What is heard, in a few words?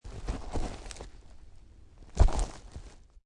Dinosaur In Forest, Dinosaur eating in forest area; Dinosaur in forest. Jurassic creature. Dinosaur eating in forest area. Heavy footsteps and rustling leaves.